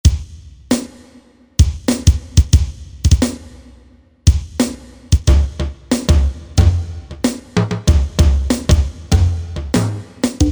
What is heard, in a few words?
Drums; Korg MSC-10S Ethnic